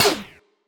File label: Ricochet